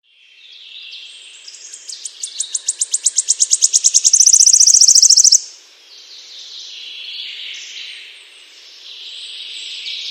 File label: cute birds in forest; animals